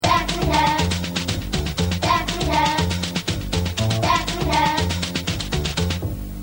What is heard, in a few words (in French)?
jingle